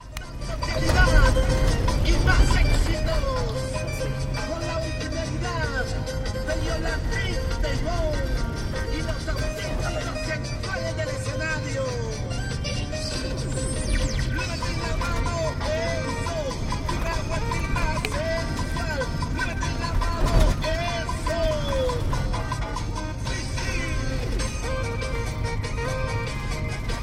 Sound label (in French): radio en 4x4; Chili; Atacama